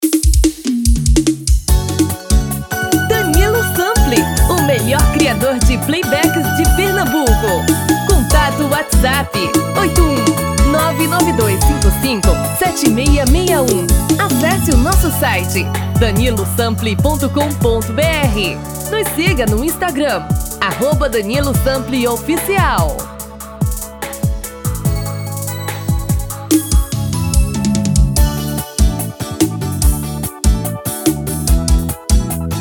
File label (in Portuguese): tom feminino